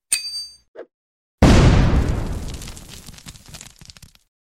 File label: they pull the pin throw a grenade; zvuk granatyi